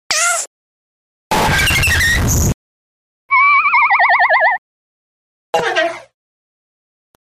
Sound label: Digi Kitty Distortion Bird Horse Play